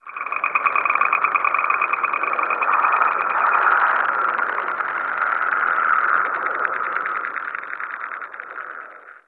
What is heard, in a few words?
data trans random, citadel; ambient; Garry's Mod